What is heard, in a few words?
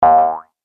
jump; Looney Tunes - Avalanche Snowboarding